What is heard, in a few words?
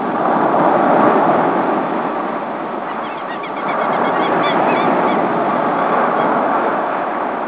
seashore